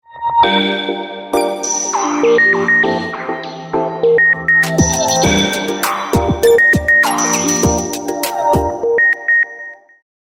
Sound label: Samsung ringtone